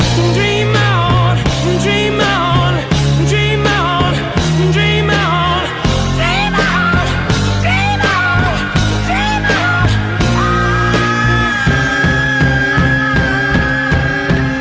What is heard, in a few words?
sing